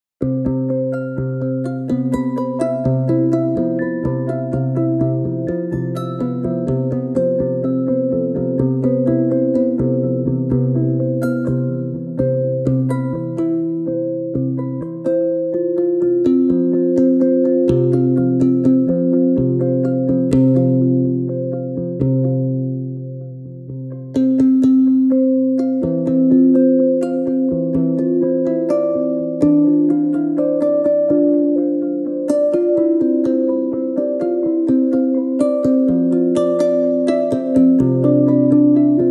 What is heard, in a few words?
Delayed Arpeggio; nemus numerical sound restoration